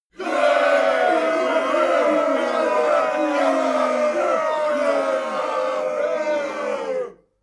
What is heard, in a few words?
battle cry